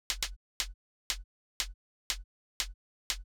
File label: Hat Low; hydrogen